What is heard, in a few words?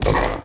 hit pig; animal; Tony Hawk's Project 8; XBOX